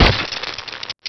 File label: emp; quake2; coop